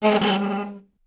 moving chair; furniture

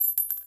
needle drop; casing

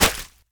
flesh stab; bayonet

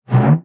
table move, dragging

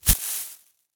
leaves rustle, trees

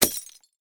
Incandescent light bulb break

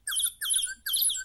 bird trills

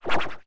boomerang, laser shot

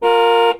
car horn

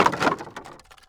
wood plank drop

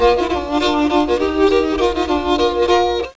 FIDDLE

